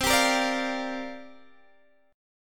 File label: C 9th Suspended 4th, Guitar, Open D